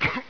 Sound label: pain, player; hl2